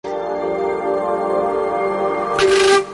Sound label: melody